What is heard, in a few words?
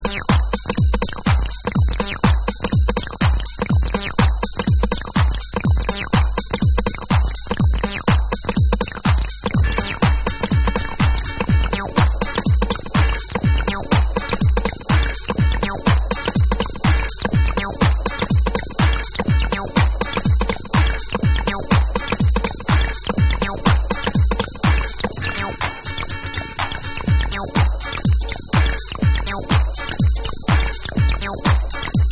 HARDCORE HIP HOUSE